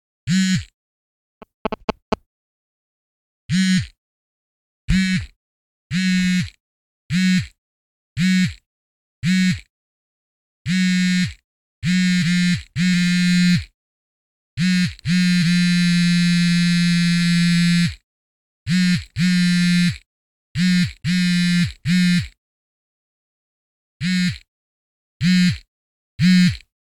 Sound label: Cell Odd Vibrate And Feed Back